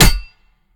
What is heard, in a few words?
swing hit; smite; lancelot